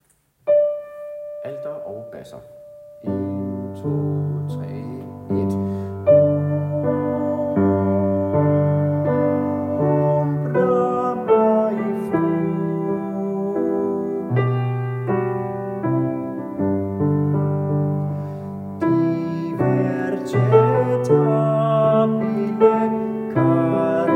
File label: Ombra alt bas; laudate kirkens folkekor